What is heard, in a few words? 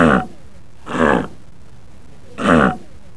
sea lion sounds